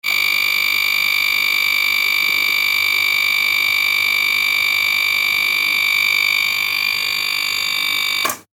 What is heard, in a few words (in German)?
Eieruhr